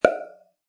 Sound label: pop